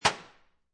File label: Foley, Aluminum Spray Can Placed Down On Metal Shelf; Set Down; Set Downs